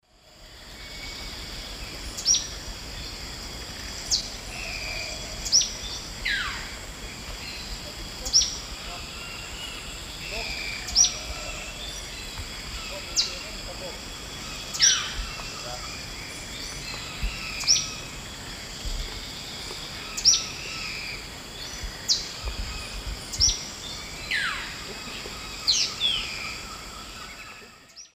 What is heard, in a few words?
Euphonia chrysopasta, 2 call types; S. America; Eastern Lowlands